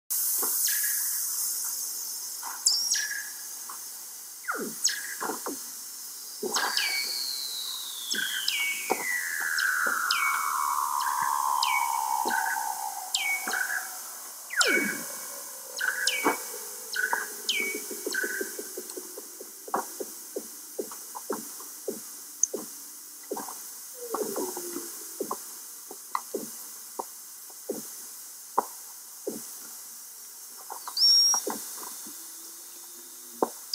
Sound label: antarctic seal sound